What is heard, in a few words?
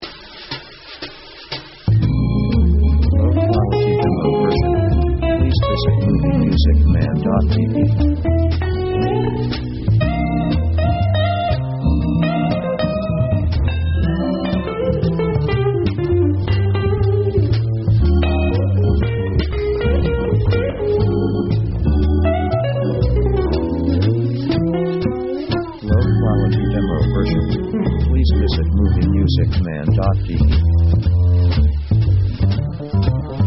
jazz brush rising; easy themes